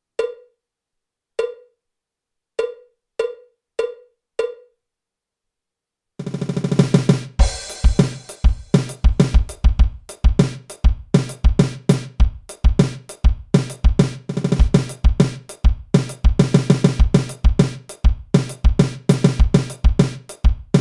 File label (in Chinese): 架子鼓独奏 摇滚融合; 66条架子鼓独奏SOLO